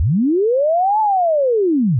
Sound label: Woo